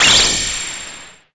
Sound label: prestige